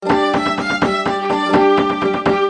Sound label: french message tone, ringtone